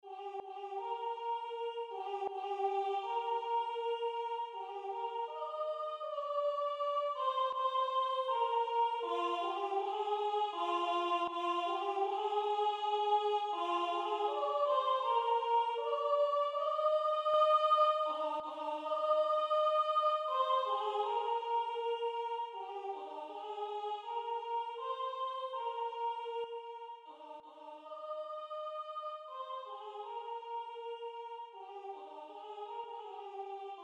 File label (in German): guten abend gut nacht sop; sopran